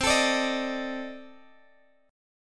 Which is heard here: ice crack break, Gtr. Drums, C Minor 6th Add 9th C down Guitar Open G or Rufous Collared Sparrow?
C Minor 6th Add 9th C down Guitar Open G